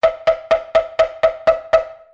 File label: hammering